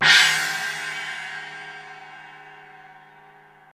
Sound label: crash cymbal single shot g key; 1990s